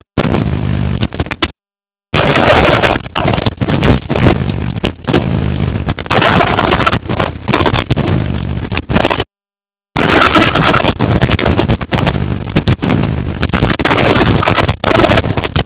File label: beat box